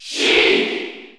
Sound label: Sheik Cheer French